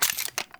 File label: Revolver Rifle Reload; Warframe; Weapons; Tenno